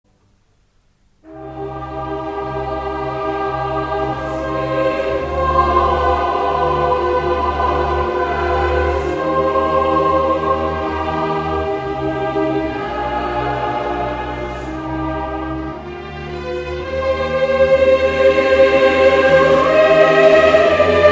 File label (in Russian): Хор пастухов и пастушек